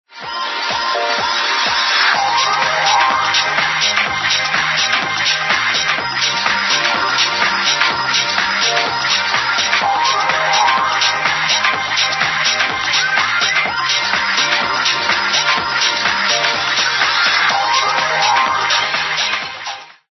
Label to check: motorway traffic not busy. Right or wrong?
Wrong